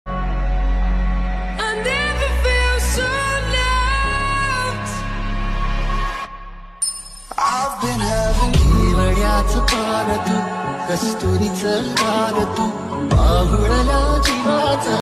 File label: dj ringtone